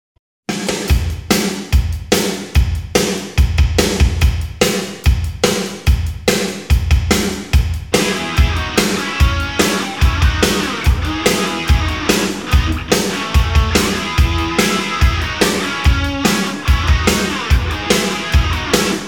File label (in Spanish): Rock En Espanol Mix; Mobile DJ